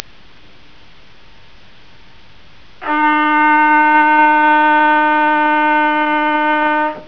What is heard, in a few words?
trumpet